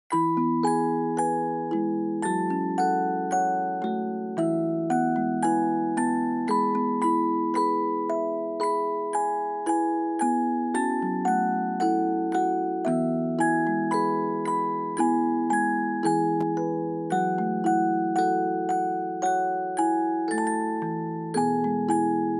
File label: Dry Fast; bgm